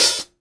CLOSE, HI-HATS; Sampleheads; New York City Drumworks VOL-1; SP HI-HATS

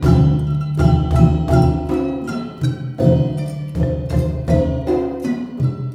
MALAY R, Heart of Asia

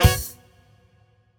Misc Stab

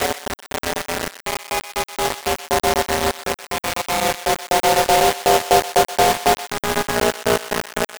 Envelope Beat; Drums & Drones

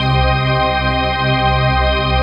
PAD, Dance Mega Synth

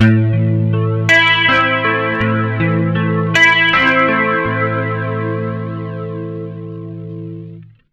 80 MIN ARP A, Acoustic Guitar Loops; USB Soundscan vol.04 - Electric & Acoustic Guitar Loops; AKAI

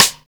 Rimshot; Equipped Music; Loungin House

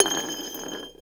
JAR SCRAPE; Rarefaction; Poke in The Ear With A Sharp Stick

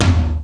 Tom Hi, Fairlight CMI III

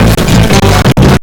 SEARCH LOOP, Big Beat; Big Beat Samples & More; AKAI; ALL LOOP KIT

